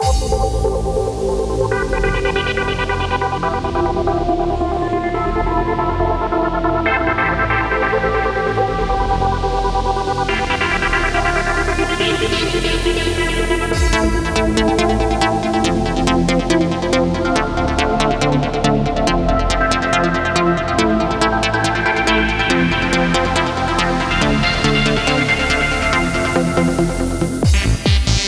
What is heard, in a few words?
JUP 8000 V, trance; Arturia JUP 8000 V; soundbank; trance music; Trance Essentials Soundbank Vol 4